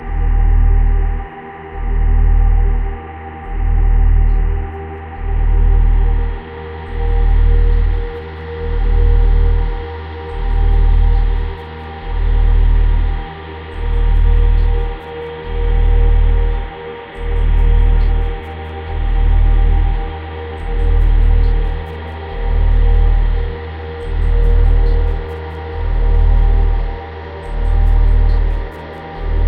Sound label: ambience; Mirror's Edge (2008)